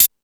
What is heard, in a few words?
High Hat, Drum Machines E-MU Pro-Cussion